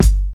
old school natural kick drum one shot g key, Royality free kick single shot